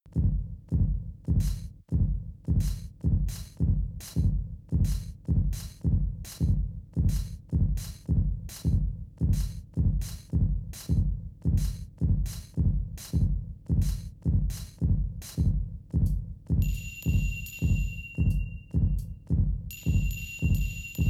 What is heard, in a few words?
DISCO